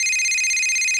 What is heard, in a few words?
phone left; florent; grey